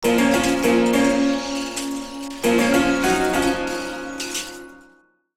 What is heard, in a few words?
depressed in spirits